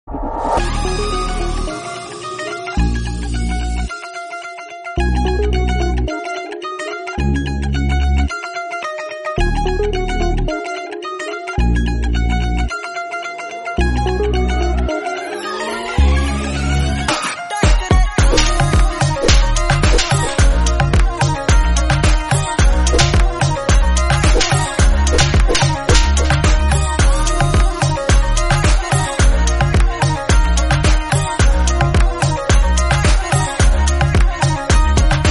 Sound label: Instrumental